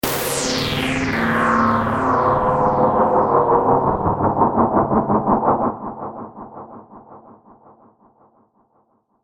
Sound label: Futuristic electrical power surge.; Sound Design